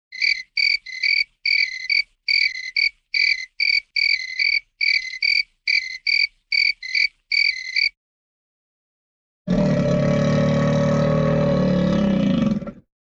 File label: Cricket Two Crickets Chirping, Animal